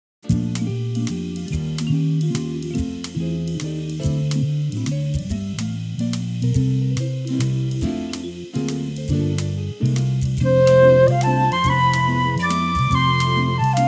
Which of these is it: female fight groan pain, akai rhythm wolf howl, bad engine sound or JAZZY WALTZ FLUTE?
JAZZY WALTZ FLUTE